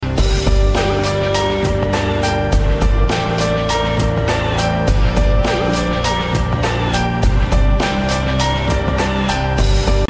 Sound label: win; matrix